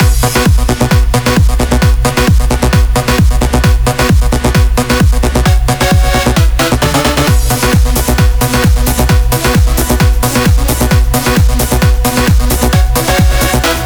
132BPM Elemental Mix

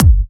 Bassdrum; Vengeance Samples; VEE Bassdrums

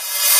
Open Hats, Cymbals

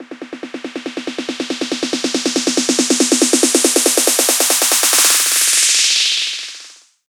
140BPM SNARE 4Bars; Vengeance Samples; Snares&FX Pairs

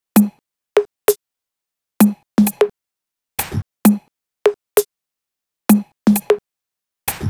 130BPM Jig Kit; Vengeance Samples; DK Jig Kit

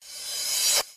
Cymbals Reverse Crash; Vengeance Samples

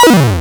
Arcade Riffs

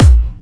Bassdrums Clubby Kicks; Vengeance Samples

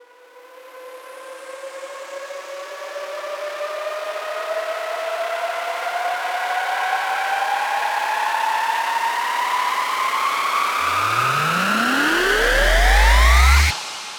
140BPM 8Bars, HandsUp & Trance Fills; Stardust; Vengeance Samples

